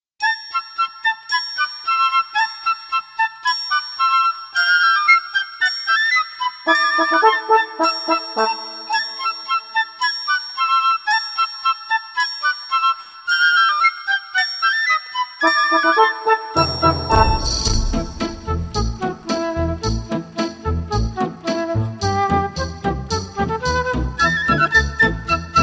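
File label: accompaniment, choeur des gamins, A minor; carmen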